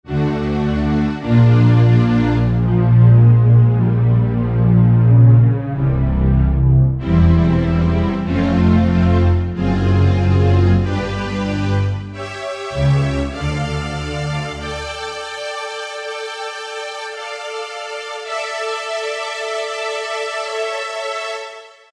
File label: Strings, Yamaha DJX; Voices